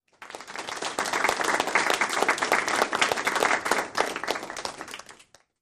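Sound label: Applause 20 People Polit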